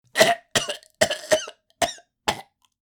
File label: Old man choking distress gasps